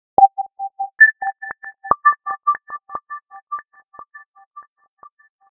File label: Lack of Distortion